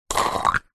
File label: food vegemite plastic jar lid remove